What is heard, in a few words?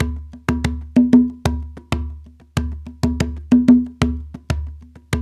TONGA; Spectrasonics; Supreme Beats; African Contemporary